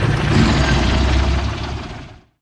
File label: titan stop; gdi